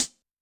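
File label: Close, HiHat; Yamaha DTXpress-II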